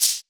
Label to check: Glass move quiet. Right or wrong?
Wrong